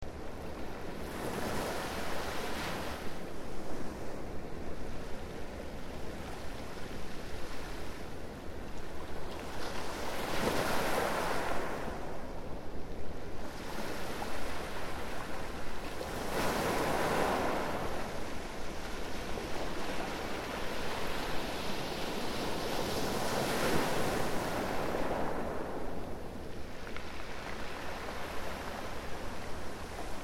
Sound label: wave